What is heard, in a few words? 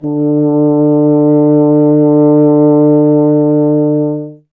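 French horn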